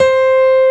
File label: PIANO C4; Analogon